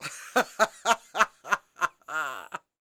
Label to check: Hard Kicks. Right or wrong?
Wrong